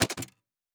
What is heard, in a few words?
Build Interface